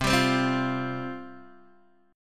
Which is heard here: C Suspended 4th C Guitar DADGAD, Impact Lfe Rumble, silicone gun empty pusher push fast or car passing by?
C Suspended 4th C Guitar DADGAD